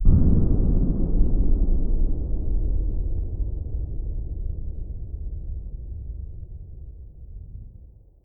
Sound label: Ship explosion